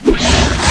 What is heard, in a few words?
claw miss